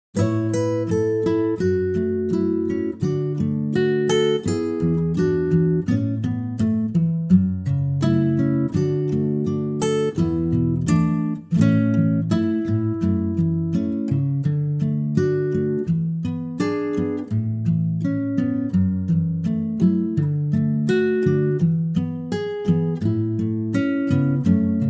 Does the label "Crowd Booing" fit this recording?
No